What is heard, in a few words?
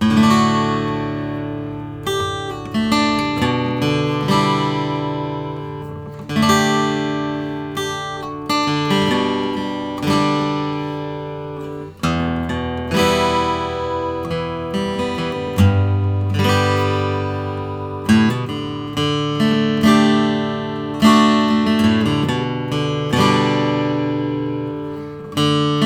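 style 1 adirondack natural; r taylor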